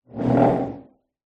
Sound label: household dining chair wood slide laminate floor distant room reverb; zapsplat